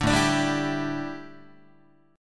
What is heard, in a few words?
A 7th Suspended 4th, A, down, Guitar